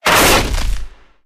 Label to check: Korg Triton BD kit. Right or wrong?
Wrong